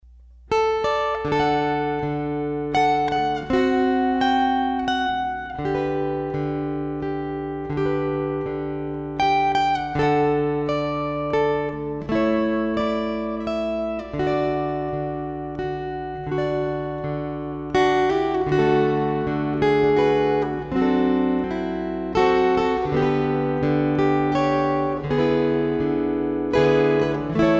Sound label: String Choir